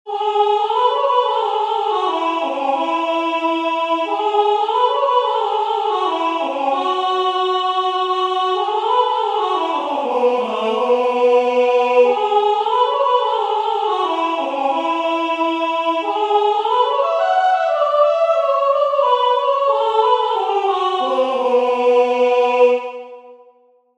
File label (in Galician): entoacion voz